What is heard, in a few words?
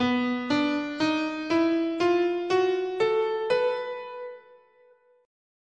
B Mixo Blues